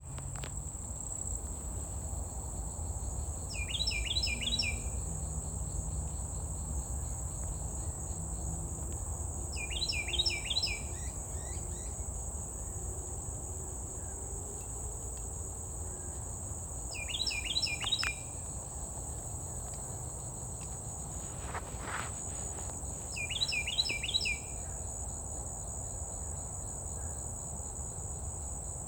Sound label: carolina wren great crested flycatcher; field recordings; scout island